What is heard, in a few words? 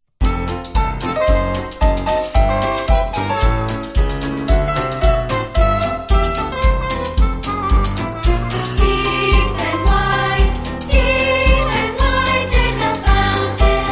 Vocal